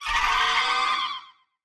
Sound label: Roar; Giggling Minister